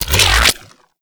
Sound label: Shotgun Beam Retract B; Tenno; Revenant